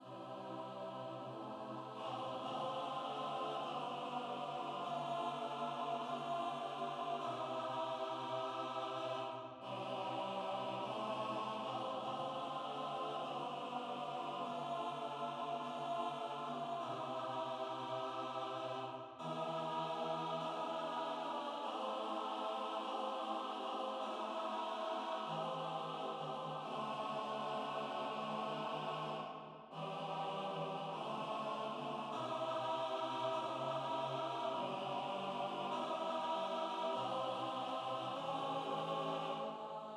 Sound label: SATB w Piano Accomp